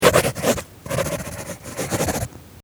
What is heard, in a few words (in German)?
office schreiben